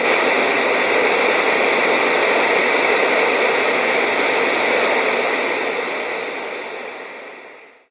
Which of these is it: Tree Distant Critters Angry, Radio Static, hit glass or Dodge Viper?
Radio Static